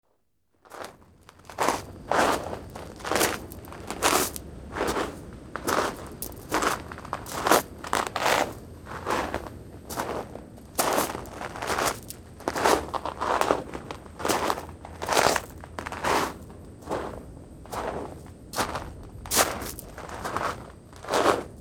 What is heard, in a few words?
Walking On Gravel; Halloween Sound Effects